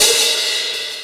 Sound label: Hat Open